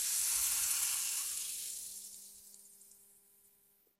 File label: embers sizzle